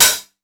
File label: 909 OHH; Wizoo; Platinum 24 Electronic; HHAT